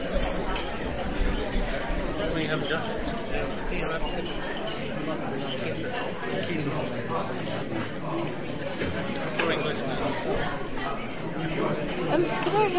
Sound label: Amb Restaurant